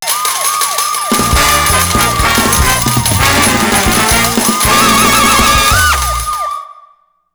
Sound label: exciter short